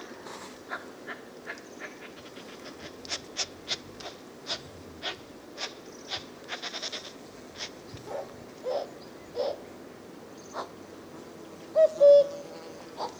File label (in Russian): kukushka cuculus canorus, zvuki kukushki